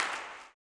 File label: CLAP, Blues Soul; Spectrasonics Vocal Planet